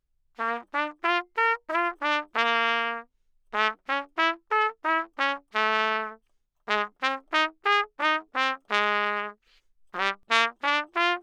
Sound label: Trumpet Anechoic